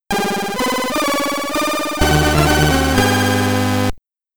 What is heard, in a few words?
Level Completed! (SID Stereo); Pi.R Squared; Commodore 64